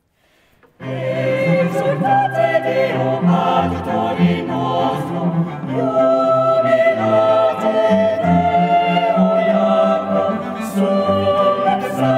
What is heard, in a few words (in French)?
Ensemble familial; Exultate Deo; Haydn